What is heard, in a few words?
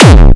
Distorted Kick